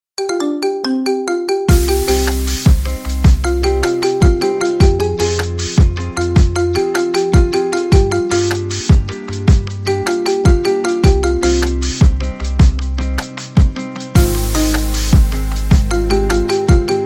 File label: marimba remix